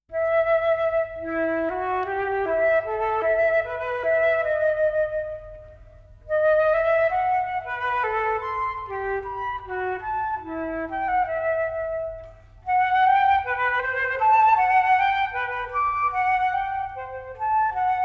Solo Flute; Telemann; TWV40-9

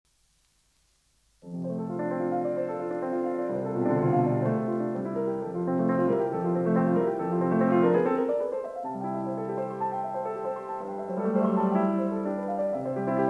Preludes; Chopin; Op28; Lympany

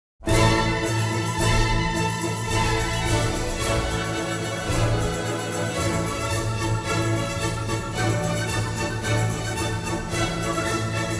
Capriccio Espagnol; Rimsky-Korsakov

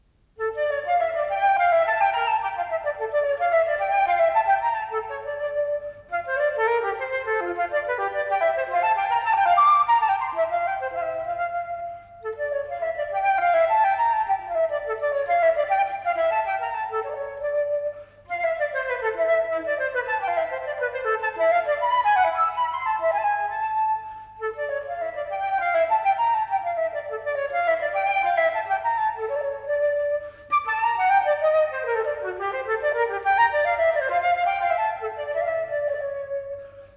Solo Flute; Telemann; TWV40-7

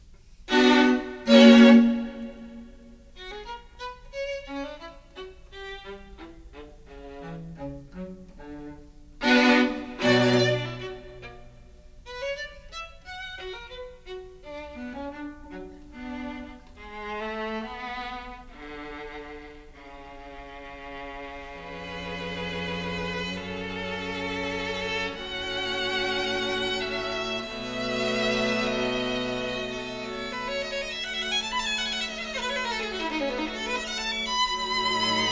String Quartet; Beethoven; String Quartet No14 Op131; Juilliard SQ; RCA

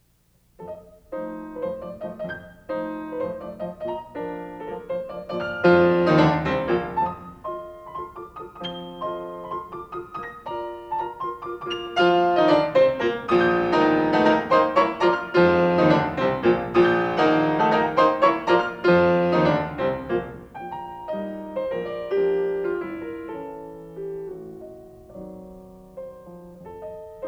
Carnaval for piano; Schumann; Op9

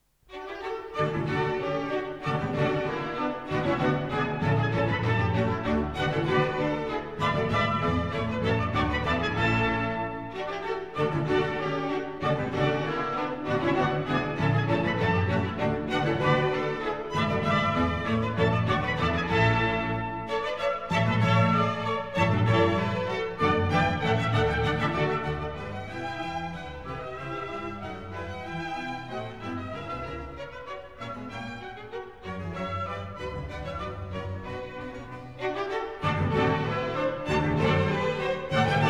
Bach Orchestral Suite; Orchestral Suite No3 BWV1068; Munchinger

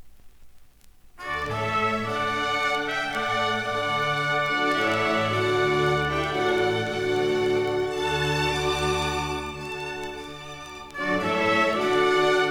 Water Music; Handel; HVW349

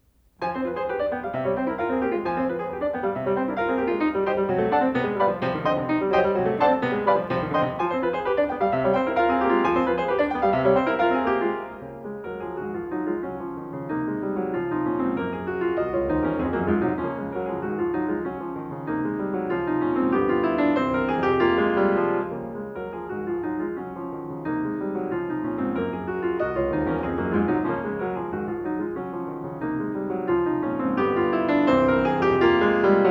Carnaval for piano; Schumann; Op9; Rubinstein

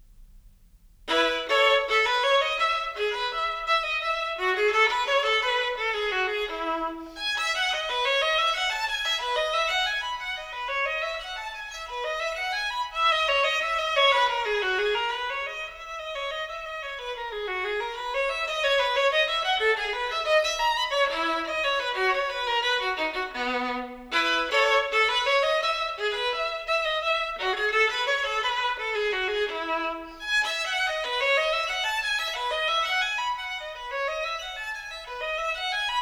Bach Violin Partita No3 BWV1006; Laredo

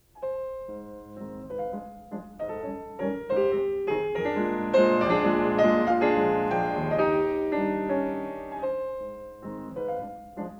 Carnaval for piano; Schumann; Op9

